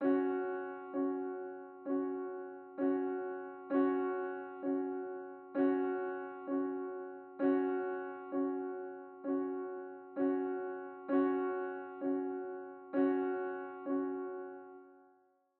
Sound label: ELECTRO ROCK PIANO HIGH